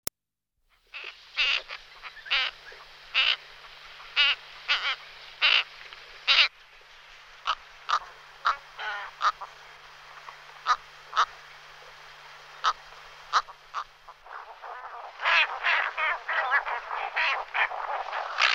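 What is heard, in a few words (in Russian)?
egipetskaya caplya golos, cattle egret voice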